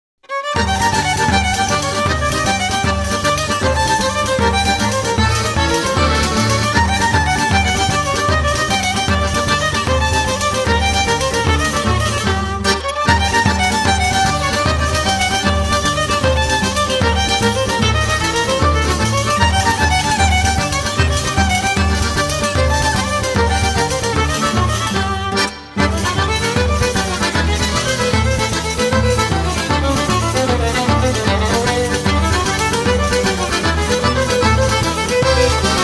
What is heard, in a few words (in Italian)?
tarantella; primavera